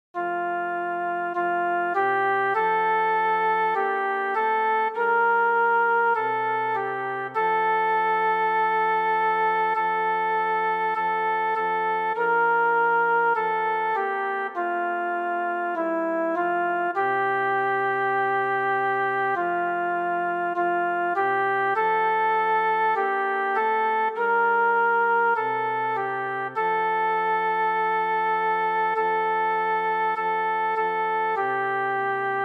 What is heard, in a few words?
soprano